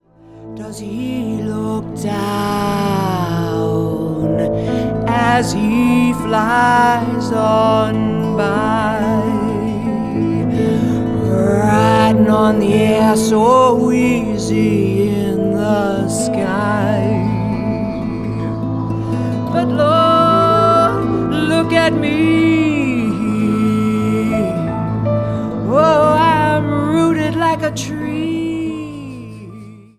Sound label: Jazz